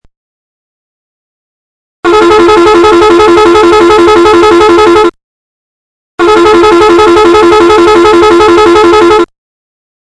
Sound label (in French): trompes